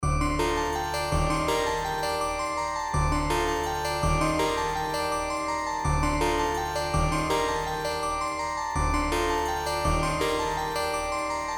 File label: G min 165 BPM Keys